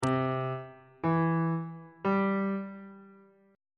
g flat locrian chord 5 is d double flat major on bass clef